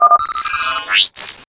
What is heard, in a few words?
notification